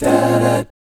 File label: BBMI7, GUY CHORDS; Spectrasonics; Vocal Planet; Jazz FX